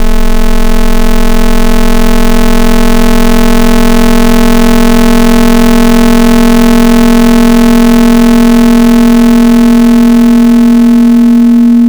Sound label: Buzzy and Soft, FM Wavetables; Cymatics; Virus Wavetables; Serum